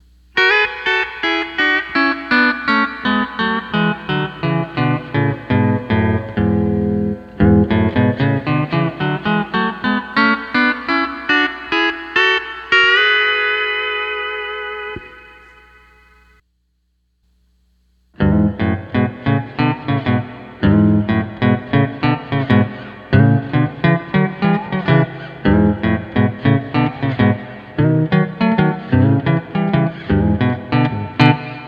C6 Scale Riff

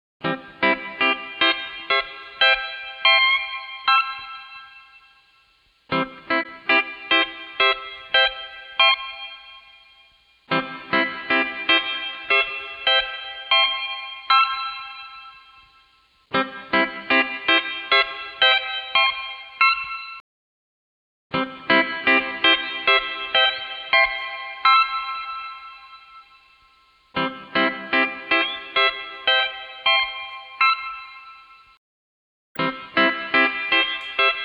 Reverb, Quilter Steelaire Pro

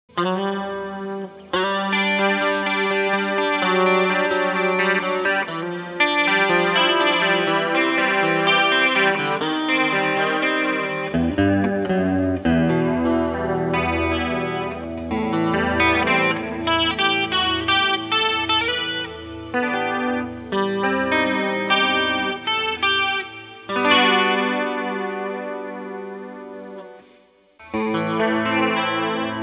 Guitar Chorus

